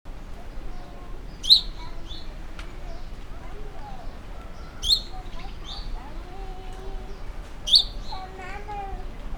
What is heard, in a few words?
Passer domesticus; SETE